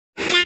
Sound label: big duck quacker; Duck quack Sound Effect Meme